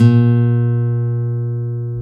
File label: GTR NYLON, Nylon Chorus; Roland LCDP02 Guitar and Bass; Nylon String